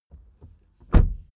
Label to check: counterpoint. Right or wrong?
Wrong